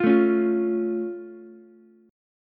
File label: Db Augmented, down, Ukulele; strummed; JGuitar